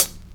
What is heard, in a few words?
studio hi hat c key; tuned drums